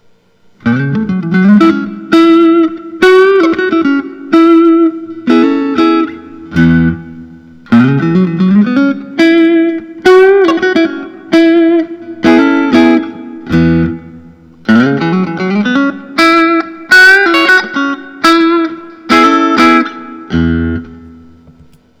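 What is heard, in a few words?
fender custom shop, Guitars Electric; rory gallagher signa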